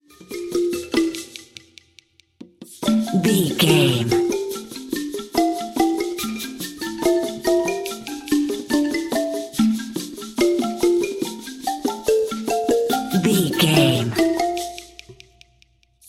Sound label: rainforest expedition